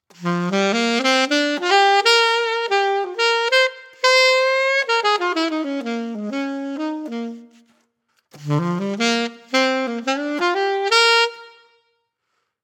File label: Reverb; GSNY